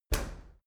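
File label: dry cleaner open soft, maschinen türen; tueren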